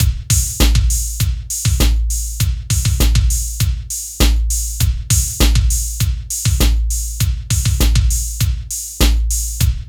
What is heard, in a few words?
HIPHOP; INIS